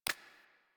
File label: UI Theme Select; DE; Warframe; Zephyr